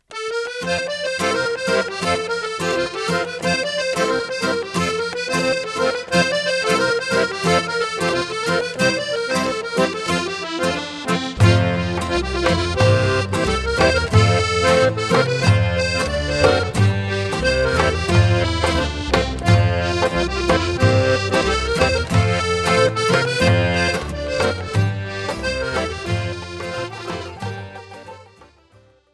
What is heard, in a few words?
chiotikos, dance